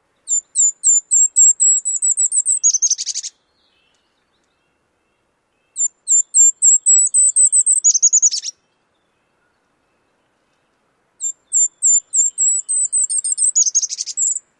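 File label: song, Golden-crowned Kinglet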